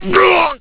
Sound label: Hit; bryan fury